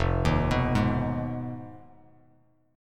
F Minor 7th Flat 5th F down Bass Standard; Fm7b5 arpeggiated; JGuitar

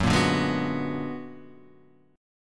B-Minor Major 13th

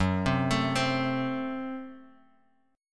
F sharp Diminished F sharp; F#dim Chord {2 3 X 2 1 X} arpeggiated

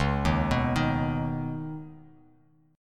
C sharp 7th Suspended 2nd, C sharp, down; arpeggiated; chord

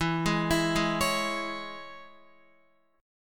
E 6th, down, Guitar; arpeggiated

